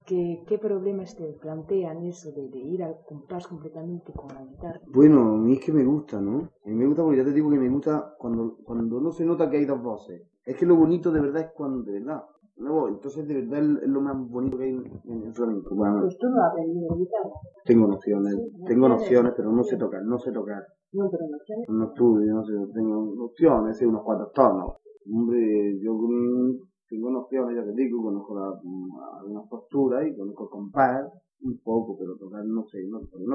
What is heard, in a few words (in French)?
La guitare suite